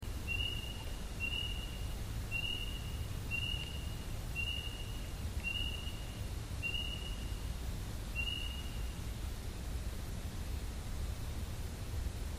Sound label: double knock; Double wood knock on ATV trail at 21:18hrs 3-12-10, this is a rare (at least for us) double Wood Knock, and at very close range.